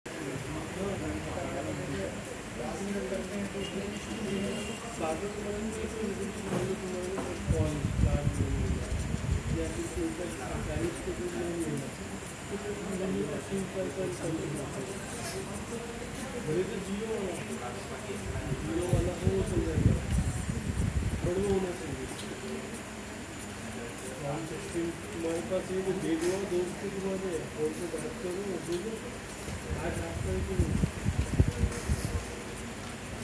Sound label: karaoke recording